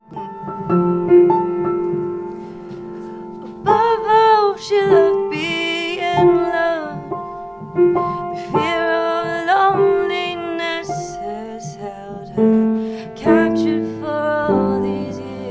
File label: piano version